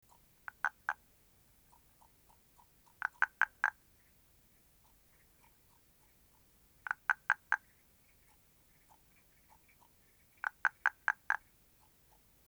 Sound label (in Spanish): Chucao (Scelorchilus rubecula); Grabaciones de Rafael Márquez